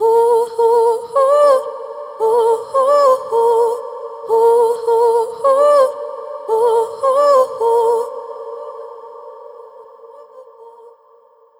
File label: vocal phrase short wet 112 Bbmaj